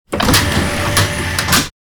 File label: Robotic Movement; SoundsCrate